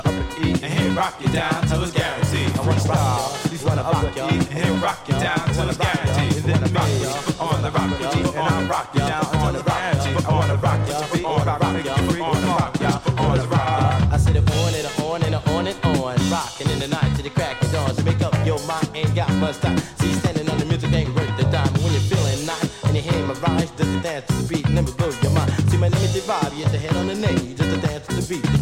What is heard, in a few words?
boombox